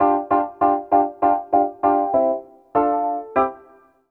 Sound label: KEYB L, Roots Funk; USB Soundscan vol.41 - Roots Funk; AKAI